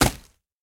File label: Obstacle Box; In Obstacle Box